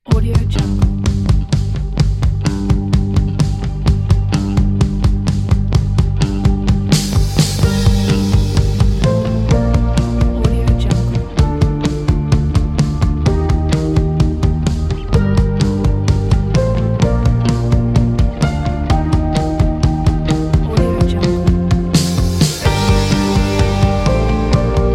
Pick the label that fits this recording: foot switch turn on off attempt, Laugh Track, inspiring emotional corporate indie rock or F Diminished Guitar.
inspiring emotional corporate indie rock